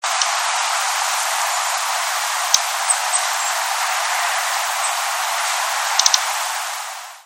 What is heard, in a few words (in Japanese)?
ojirobita